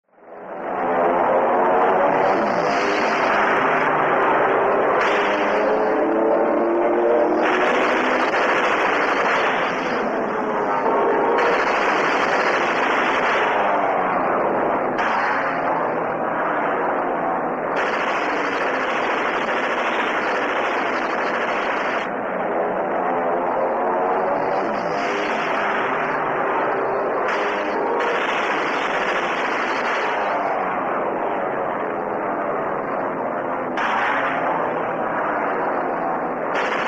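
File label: Air battle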